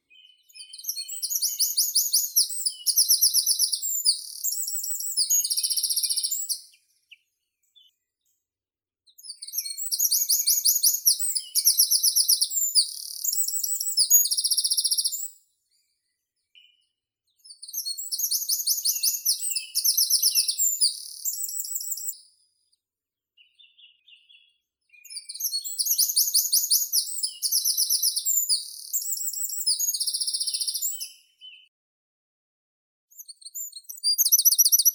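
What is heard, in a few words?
wren, Troglodytes troglodytes; krapivnik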